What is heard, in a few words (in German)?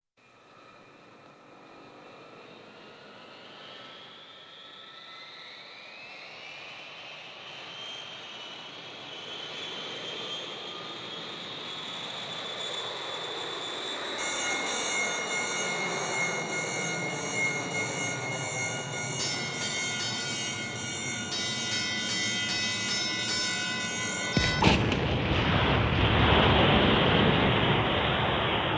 boeing 727 - absturz mit feueralarm; Katastrophen